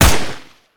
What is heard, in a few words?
Assault Rifle Single Shot